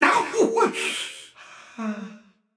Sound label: ouch, voicepack; smite; jorm